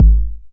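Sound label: 909 BD tape, kick drum; Goldbaby Tape808 & Tape909; The Tape 909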